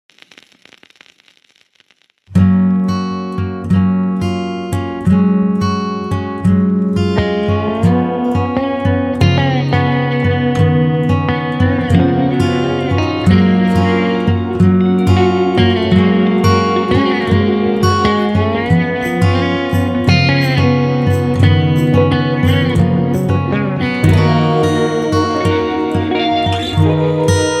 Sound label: Lullaby